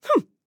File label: Mage Grunt Fast; Realm Royale; Radon Flux